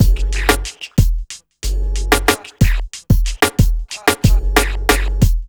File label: BEAT, 92, Hip Hop R n B; USB Soundscan vol.19; AKAI; TIMBA